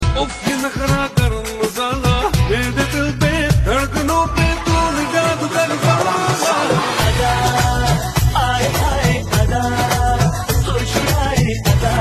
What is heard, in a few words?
Ringtone; Garam Masala